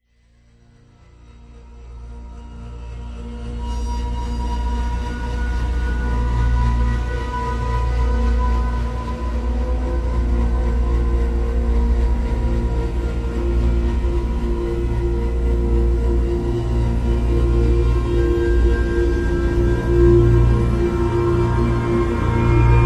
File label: Atmos; documentary